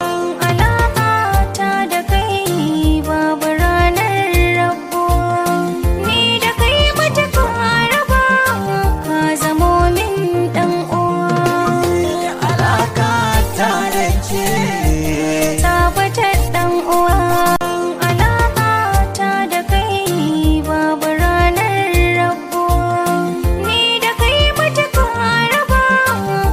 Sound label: Hausa Soundtrack